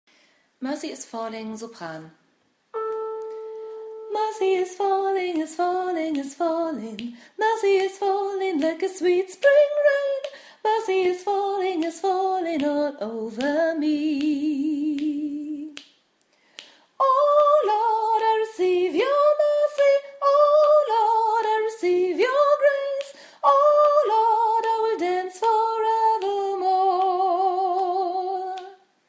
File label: Sopran, gospelchor